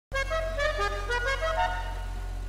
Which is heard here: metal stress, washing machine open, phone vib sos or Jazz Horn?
Jazz Horn